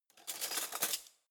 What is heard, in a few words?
cutlery spoons drawer grab soft